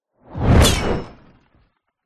sound design shockwave whoosh into glass and debris smash